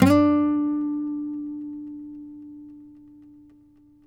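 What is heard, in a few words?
nylon, FLAMENCO, Guitars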